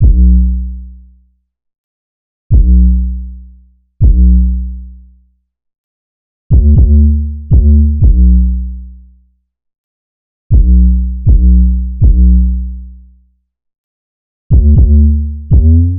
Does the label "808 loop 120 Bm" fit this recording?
Yes